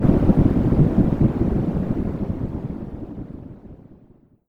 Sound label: Thunder Sound